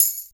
Jingle Bells

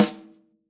Yamaha Holz L hand

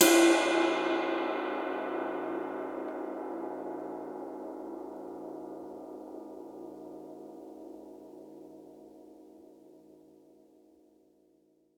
Ride

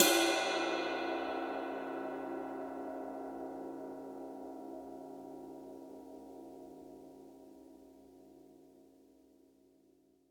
Ride

